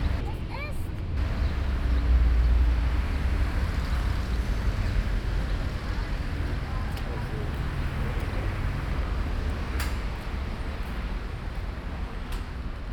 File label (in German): auf der strasse, Brasilien; Fieldrecordings